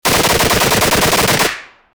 shotgun shooting 16 bit

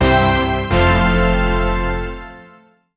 victory fanfare short cel; achievement

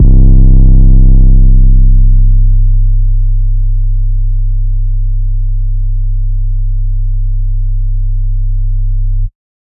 808 caveman in c key; musical

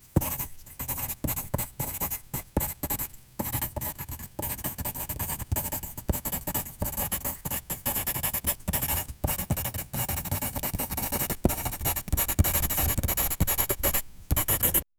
a pencil writing on paper, quiet

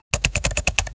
short high quali, keyboard

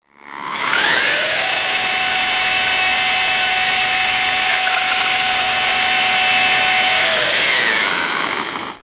drilling sound, construction

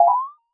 cartoon

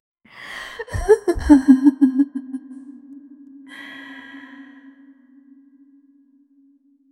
soft elegant goddess chuc, ethereal

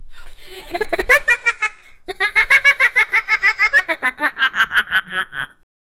a small indian pariah pup, puppy

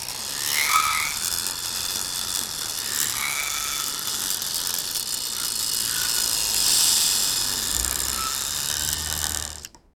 stone mechanism

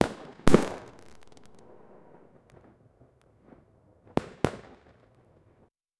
crowded firework from tur

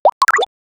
cartoony indie

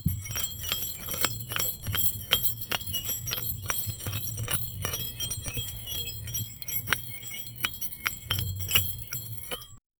a metal trowel stirring a, metallic scraping

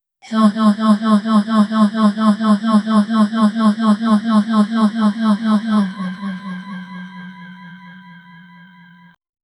kk kkrrrruhhhchk chk chkz, robotic

